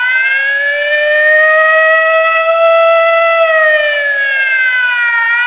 siren; svencoop

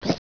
bandit draw; gearbox

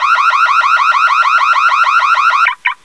car alarm

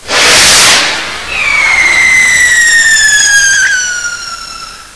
mortar; dod

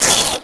pain, houndeye; gearbox

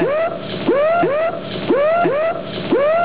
siren

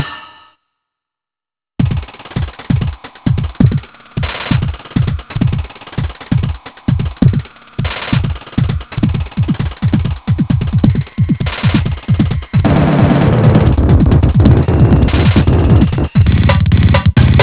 the end loop

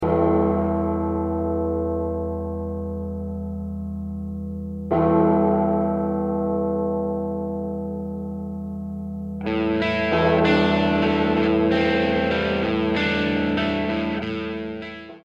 hells bells; svencoop

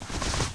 slippers

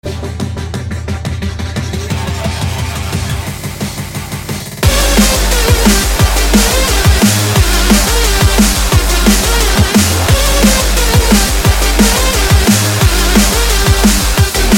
win music

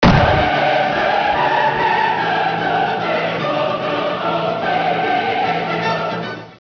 max level; svencoop; scxpm

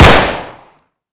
usp; brainbread

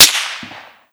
snap

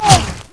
whizz, weapons

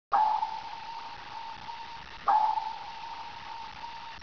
hatchet idle; gearbox

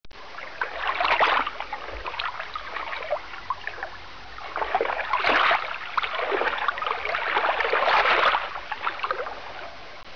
nice water; tfc

